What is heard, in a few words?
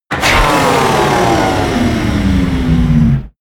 wind down